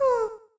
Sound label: pant; toadette; char-select-extra-chars; sm64coopdx